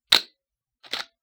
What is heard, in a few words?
d3s shutter q